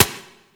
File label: Turret Low Clip; weapons; Lasrian APC; Warframe